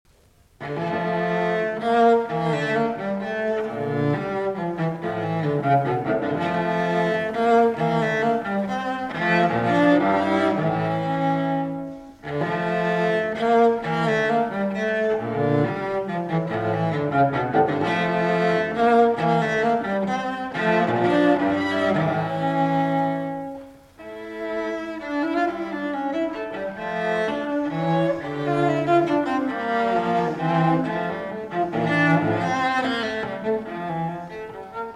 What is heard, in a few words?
Cello Suite No. 2 in D Minor, Menuetto; Bach, JS; BWV 1008 V. Menuetto I & II